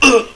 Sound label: die; Exile II - Crystal Souls